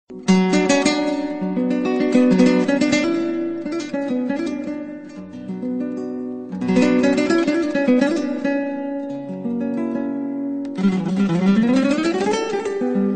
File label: spanish guitar intro